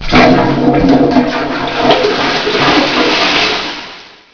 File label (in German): klobrille